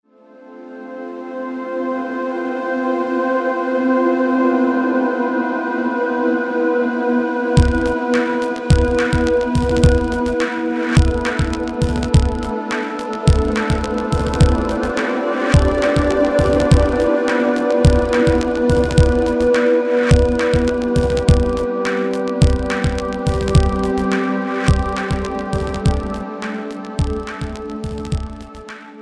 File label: flute harmo; ringtones